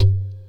Djembe cheap mic session

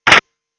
Typewriter

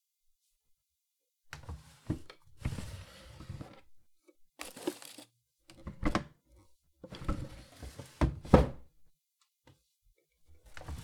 Going Through Drawers Looking For Somthing

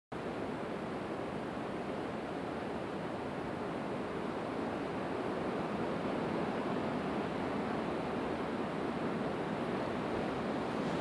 Wind Through Trees

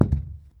Glass Footstep

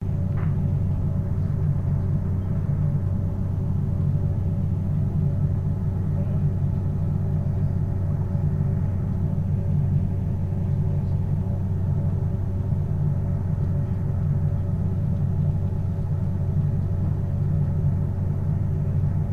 Room Tone

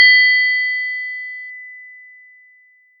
Ping

